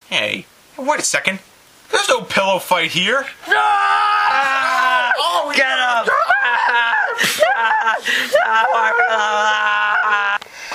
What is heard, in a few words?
pillow fight chaos